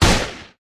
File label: Shoot Pistol; Peace, Death